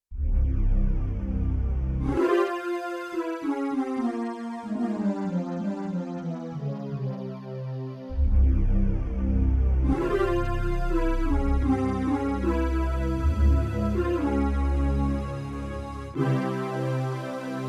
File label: 4-Voice, Synth Set, Yamaha TX16W; Big Zamb-4-Voice